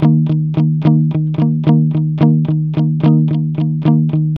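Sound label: ROCK E flat 110, Vintage Blues Guitar; USB Soundscan vol.22; AKAI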